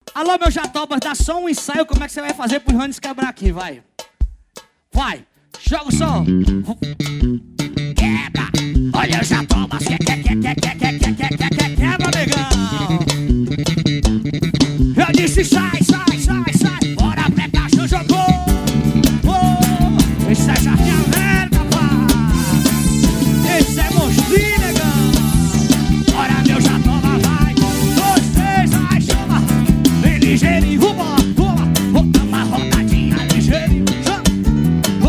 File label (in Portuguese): ao vivo ligeirinho